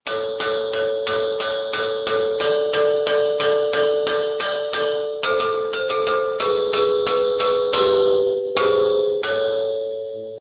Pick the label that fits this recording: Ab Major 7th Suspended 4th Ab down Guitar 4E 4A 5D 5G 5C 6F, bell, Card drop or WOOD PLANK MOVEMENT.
bell